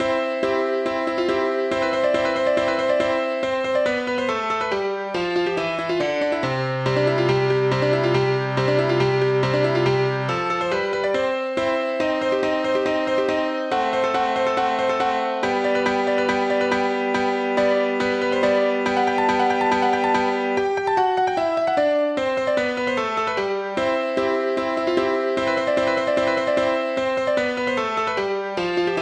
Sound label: Bagpipe Dance